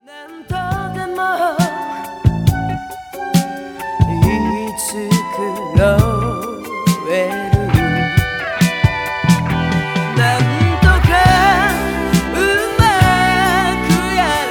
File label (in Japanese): 歌謡曲女性ポップス